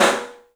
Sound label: STEEL, Drums, ROOM SINGLE; 3D Sound